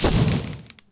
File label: Burn Goomba; New Super Mario Bros. Wii